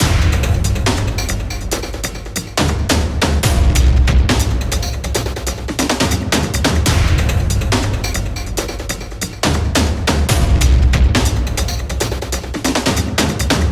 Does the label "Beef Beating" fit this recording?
No